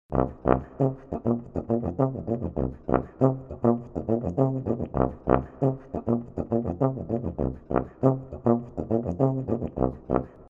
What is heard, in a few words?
Funk tuba; Grundton, Oktave, Septime und Ghost Notes; Grooves for jazz tuba